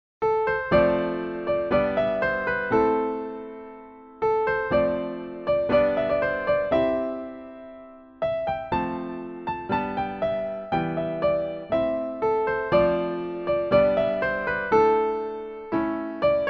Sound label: piano